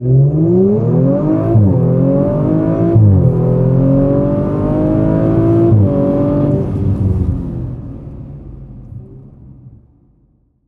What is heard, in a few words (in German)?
Audi RS5 2.9 TFSI Beschleunigungsfahrt Innen